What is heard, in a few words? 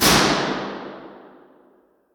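stairwell impulse response stereo; spring lane building university york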